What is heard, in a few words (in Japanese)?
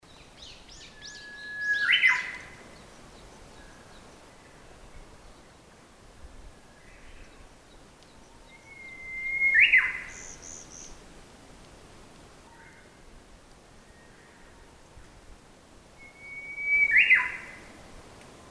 ugui sinrin